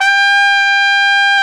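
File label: Trumpet mf, Solo Brass; Roland L-CDX-03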